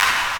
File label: Clap; Vengeance Samples